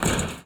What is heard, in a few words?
roof step; Golden Crayon Sounds